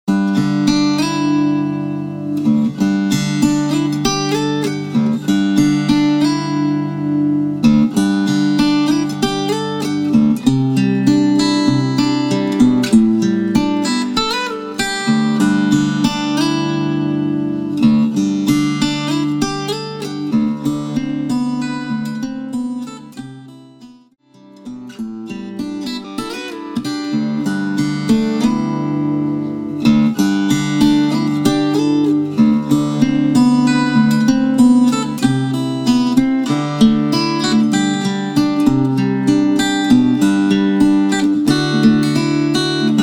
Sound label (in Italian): Bali blues